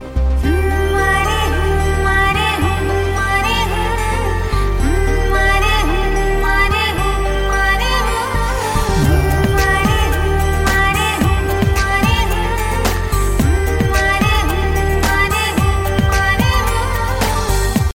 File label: Oriya Ringtone